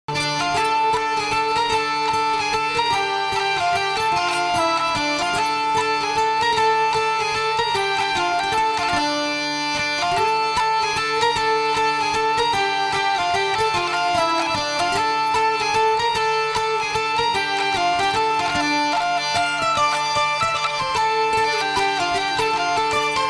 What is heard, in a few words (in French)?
Mazurka, danses; Mazurka a Dede atelier